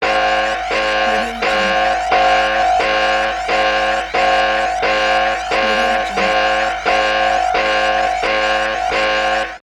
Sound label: danger alarm